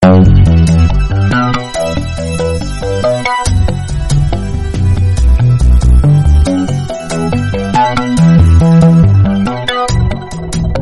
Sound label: ringtone